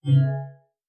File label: conv bell; pad